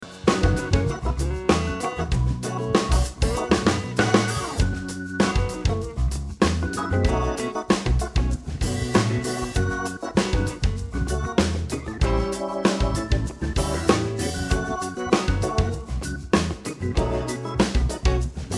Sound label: rythm guitar